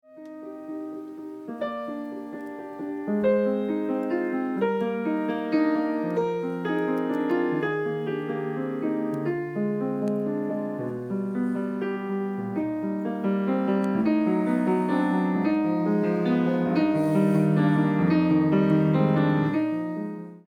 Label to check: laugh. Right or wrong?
Wrong